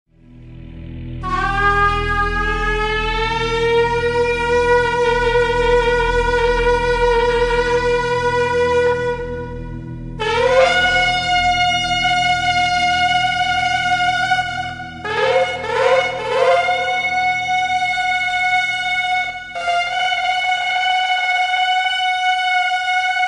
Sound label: sound shofar